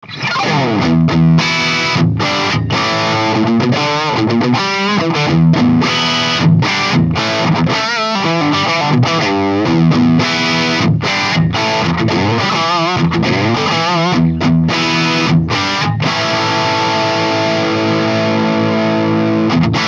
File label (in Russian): Запись микрооконечника через кабинет и микрофон; Микрооконечник для игры в линию версия 2; Сэмплы записанные с использованием преампа JCM800; Almost FGT